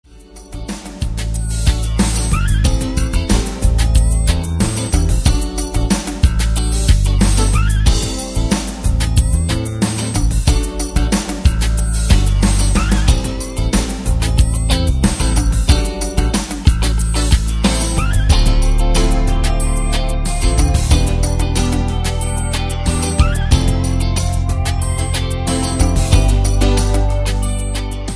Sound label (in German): hungersnot playback